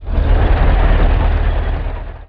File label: assault tank engine; Emperor - Battle for Dune